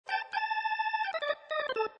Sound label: lounge organ; Lounge & Reggae; Loopology; Be Bop Baby